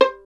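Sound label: STR violin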